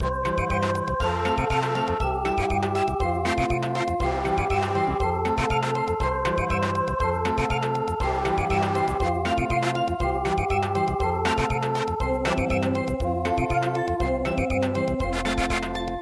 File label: Samba